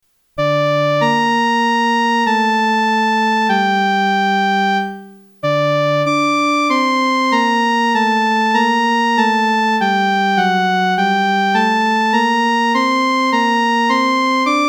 muziek herfstliederen o klaag toch niet instrument eenstemmig

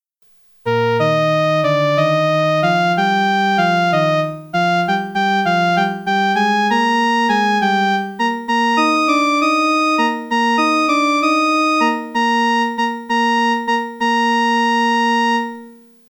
instrument eenstemmig; herfstliederen trara dat galmt als jachtgezang

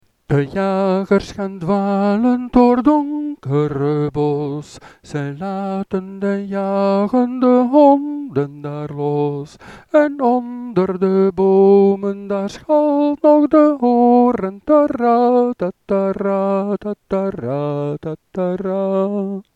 herfstliederen de jagers gaan dwalend zang eenstemmig

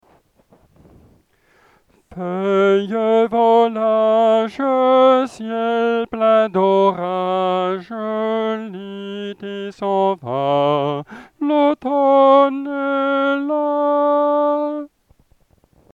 zang eenstemmig; herfstliederen feuilles volages

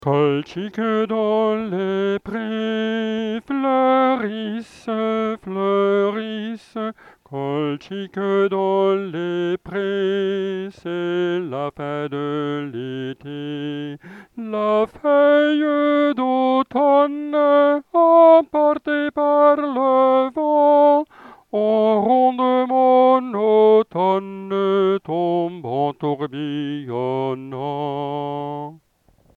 frans lied opname zang eenstemmig; colchiques dans les pres

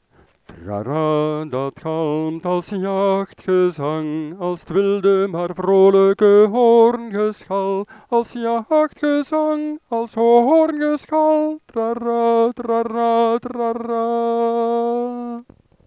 muziek herfstliederen trara dat galmt als jachtgezang zang eenstemmig

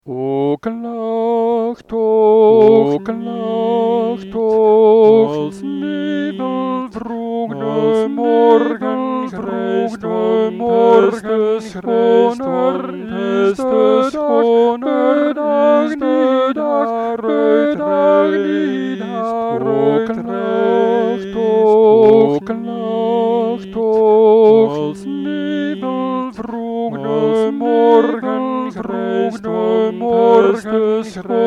herfstliederen o klaag toch niet zang tweestemmig canon

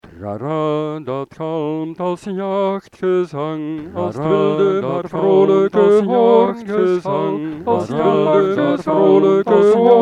muziek herfstliederen trara dat galmt als jachtgezang zang vierstemmig canon

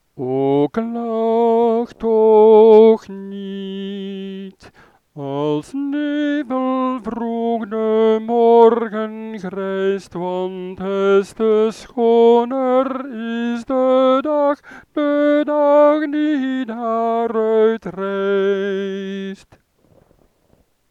herfstliederen o klaag toch niet zang eenstemmig

